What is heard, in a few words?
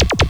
laser